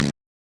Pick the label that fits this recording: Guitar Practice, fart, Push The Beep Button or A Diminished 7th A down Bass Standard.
fart